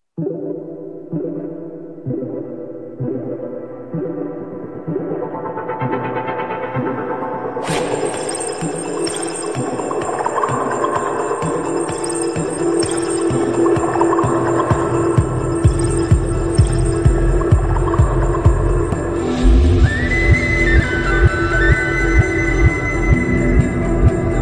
heart break bgm ringtone; premalu movie

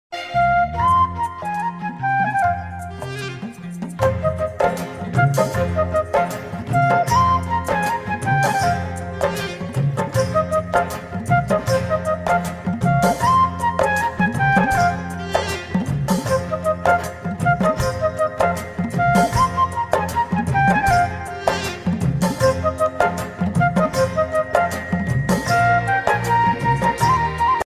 bansuri ringtone flute ringtone

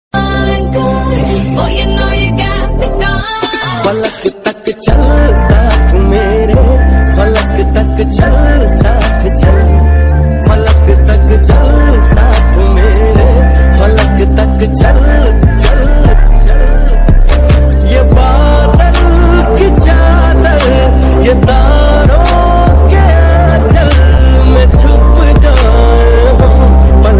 bgm ringtone love ringtone

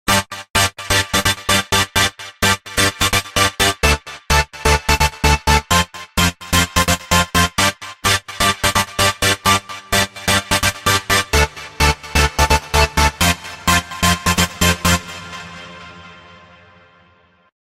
synth disco ringtone instrumental ringtones

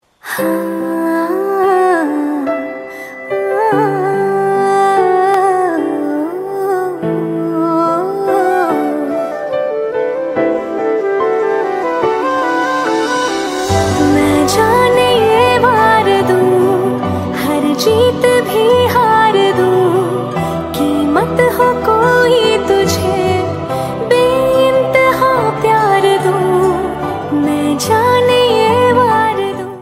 female version ringtone

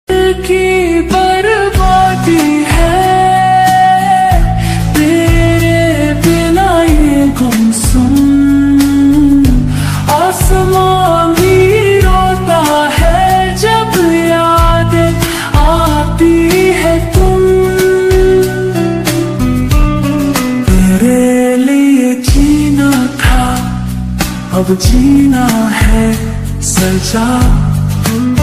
hindi ringtone, ringtone song, sad ringtone

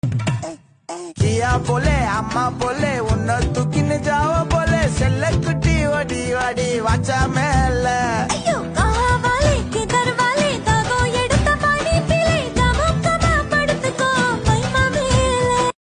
song; couple

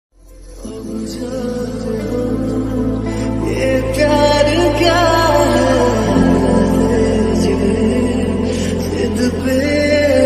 love ringtone

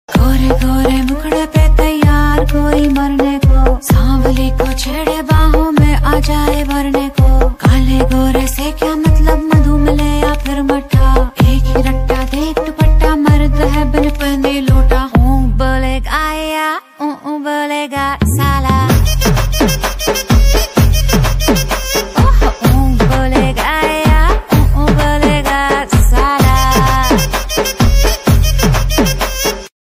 hindi ringtone

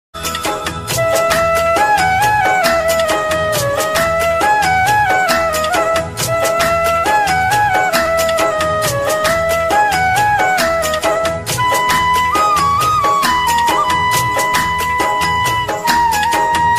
bansuri ringtone hindi ringtone

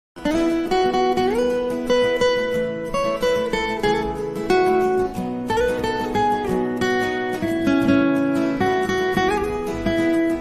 hanuman chalisa on the guitar; bhakti

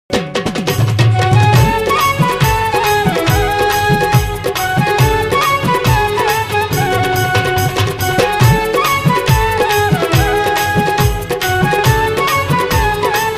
music tune

